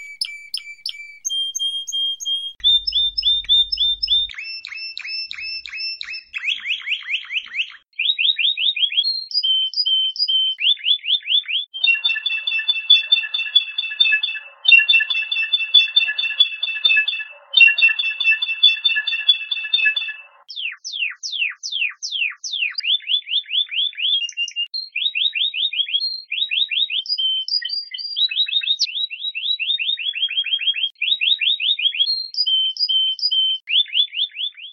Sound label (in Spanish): Floreos σωστη; θετικές νότες